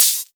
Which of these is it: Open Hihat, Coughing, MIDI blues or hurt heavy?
Open Hihat